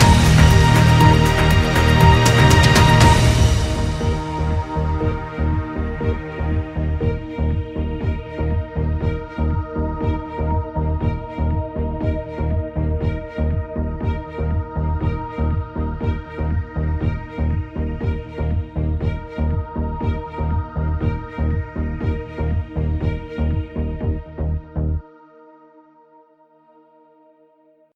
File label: Quarter Head Sting